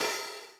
hi-hat, Millenium MPS-400

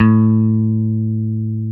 DAN OPEN A3, BASS

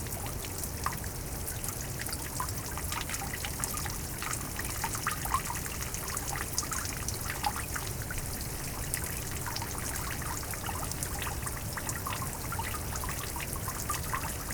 Moderate Water Flow; WATR Flow

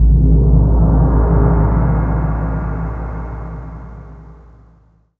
F3 GONG; Greytsounds VOL-1; SDX PERC

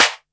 Snare, Boss DR-660, Drum Machines

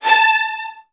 A3, Strings, VN 16TH; ILIO; Kirk Hunter

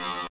HH, Drum & Bass, 160 BPM

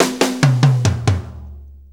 6-8 Fill, Drum; 6-8 Loops; Fills; Drum Tools

